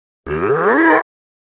thwomp; reverse

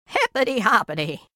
hiphop

